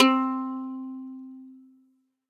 Plastic Guitar Strum (Slide); MPE; Instruments; Plucked Items; Ableton; Factory Packs; Lost and Found

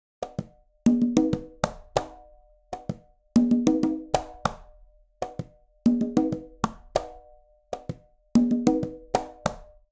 Songo 1 B Conga Tumba 96bpm, Latin Percussion; Ableton; Factory Packs

